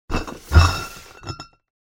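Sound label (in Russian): на дерев стол коробку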